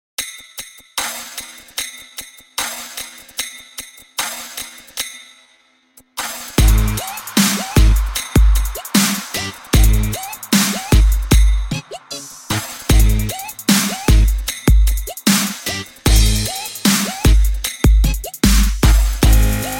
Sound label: Music Bass